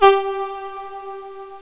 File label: VIOLA